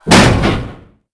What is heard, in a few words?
impact metal; Quantum Redshift